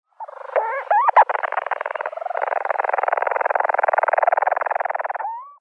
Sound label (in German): Wellenlaeufer Oceanodroma leucorhoa, Vogelstimmen